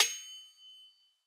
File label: Sword Clang; soundscrate